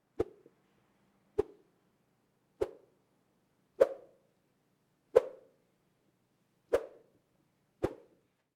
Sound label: Swift Swoosh